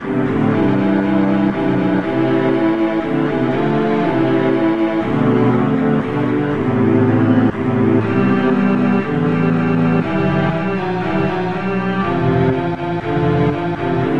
Indian National Anthem

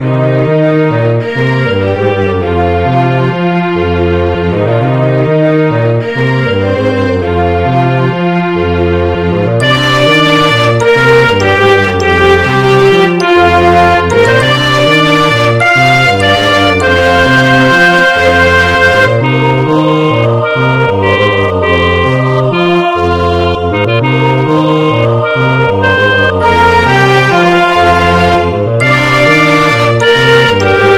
Orchestral Version

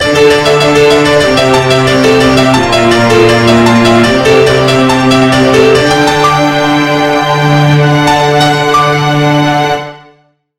Gameover

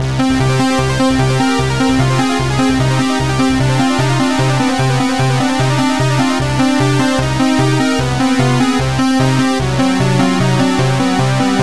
Boss Music

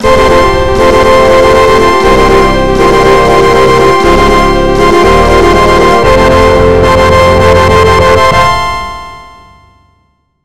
Fanfare

